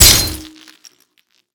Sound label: Amoro Ball Knock; Ferea